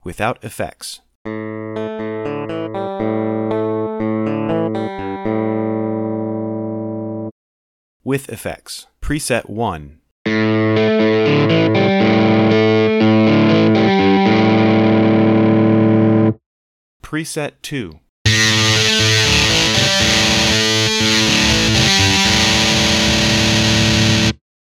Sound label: amp simulator, effects; voxengo; Mixcraft